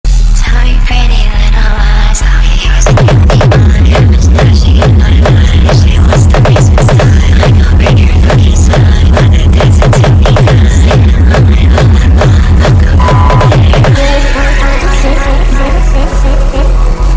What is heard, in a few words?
hardstyle remix